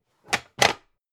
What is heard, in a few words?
pan stack hard